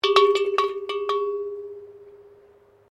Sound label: Bell Cowbell European Small, intrumental cowbell; Bells; Musical